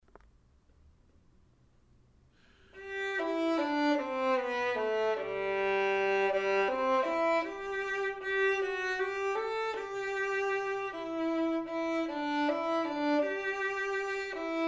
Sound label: vln perf; Building Technique with Beautiful Music, Book 1 Violin; applebaum